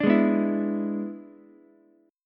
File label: E 7th E down Ukulele; E7 strummed; JGuitar